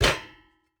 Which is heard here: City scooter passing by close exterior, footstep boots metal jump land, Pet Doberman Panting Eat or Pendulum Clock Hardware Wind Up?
footstep boots metal jump land